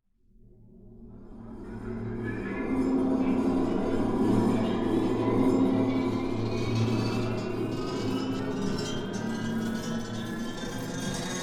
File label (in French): Frottement symbale; design sonore